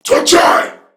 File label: Surprised Crewmen; Dialog; Barks; Battle; Warframe; CorpusCrewmen; CrewmanB